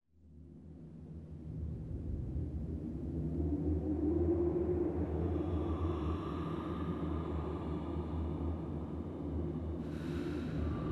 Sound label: Eerie Moaning; Mystara; TSR 2502 Hail The Heroes